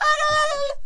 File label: Bomb Cannon Attack Vocals